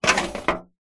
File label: office plastic file drawer tray slide open or close